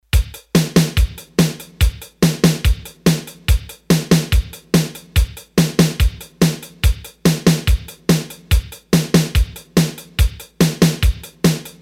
8 Beat, Roland D-10

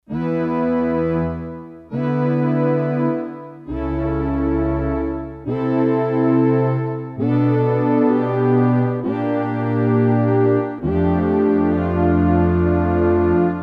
Warm Ensemble, Roland D-10; Factory Patches

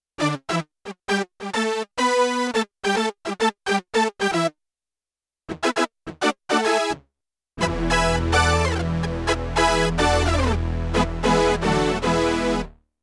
Stab Brass Octave, Yamaha TX16W; Synth Set

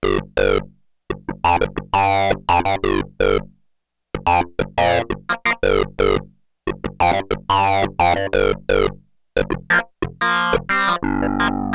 Synth Clav, Roland JX-8P

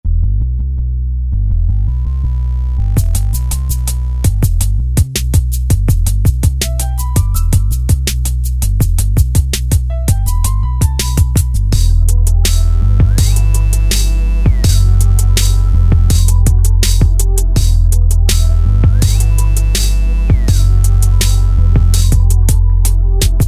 Yamaha DJX, Styles; Treach

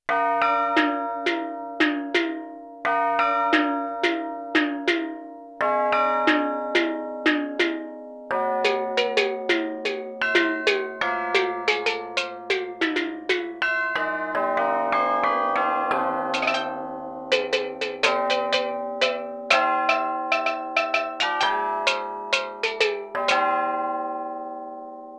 Tubular Bell & Steel Drum, Yamaha TX16W

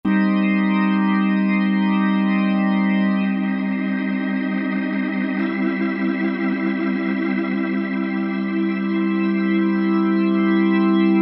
Real Organ, Roland SRX-07; Roland SRX-07 Ultimate Keys

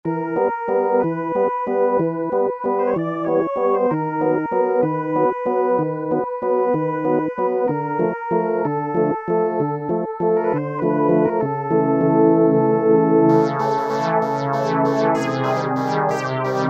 Pad Synth, E-mu Morpheus; Factory Presets

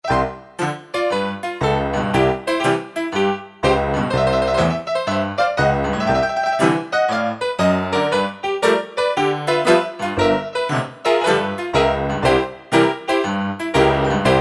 Honky Tonk, Kurzweil K2000; Kurzweil K2000 Value Pack; Extra Programs

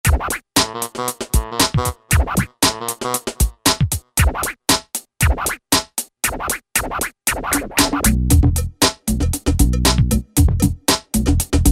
Buggin', Yamaha DJX Styles

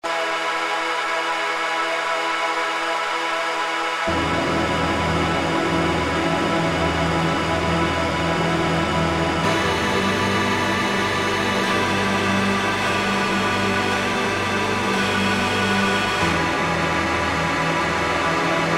Pad; Roland SR-JV80-19 House Collection

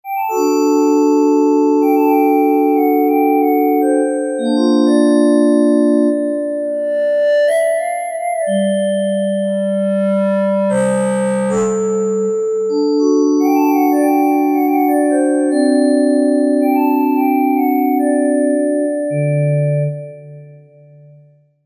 Sygn Wave

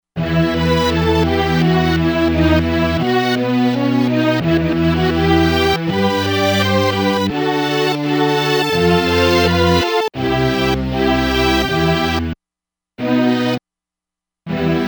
Strings No 9 Strings

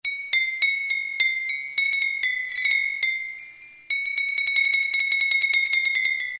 Triangle

